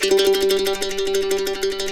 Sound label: C E trill G, Blues Guitar